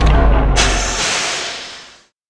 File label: train brake; vendetta; plats